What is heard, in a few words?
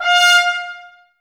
BRS, Woodwind Brass Ensemble; Miroslav Vitous